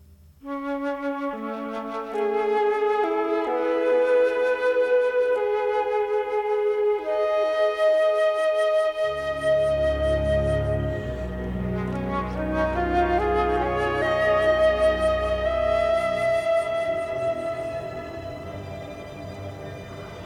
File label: Love Flute; Windwalker; Birth